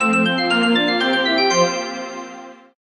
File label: win; Amatic; Book of Fruits